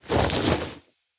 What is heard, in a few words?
coin bag underlay